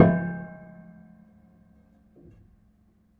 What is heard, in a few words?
Harm Low Short, Piano Harmonics